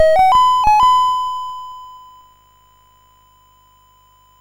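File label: Mr Wiz, MOS 6581 SID chip music, games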